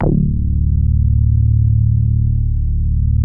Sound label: D1 RAP BASS, NY SYNTH; Northstar - New Gold VOL-2